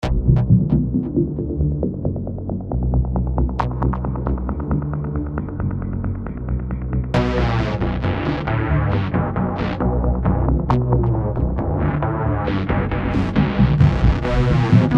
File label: Electronic Trance; Tranceference